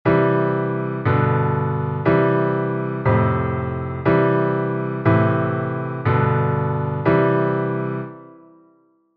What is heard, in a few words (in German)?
Akkorde ohne Umkehrung